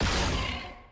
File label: skill hit; jhin skill 1